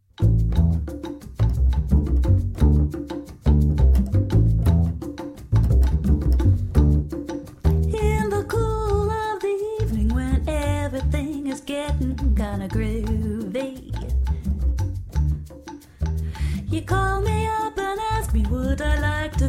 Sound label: spooky